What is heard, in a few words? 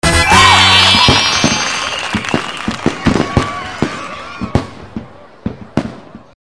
victory; freak fortress 2; hero cookie